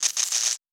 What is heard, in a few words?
Electricity; Survival Game Sounds - Lite Edition